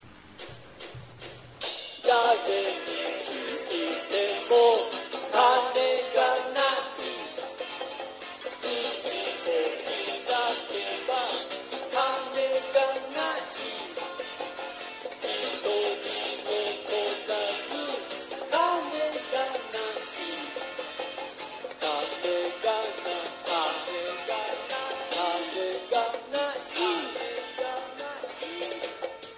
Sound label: taiko